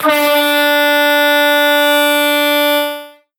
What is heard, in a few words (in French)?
SON KLAXON TROMPE BASE RECTANGULAIRE CHROME; HADLEY; 49 CM